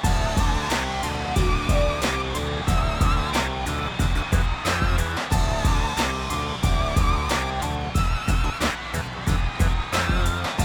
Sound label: 91BPM D, Hip Hop; InVision Interactive; 40 Oz Phat Slammin